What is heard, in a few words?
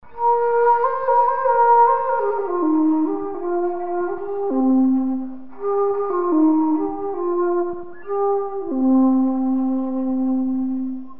shakuhachi